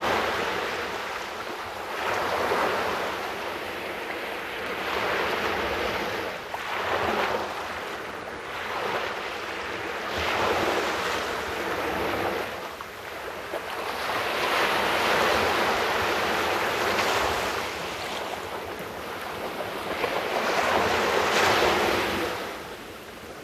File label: Live Recording (Ocean)